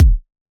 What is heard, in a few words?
kick; bespoke - Floral v.1; drum oneshots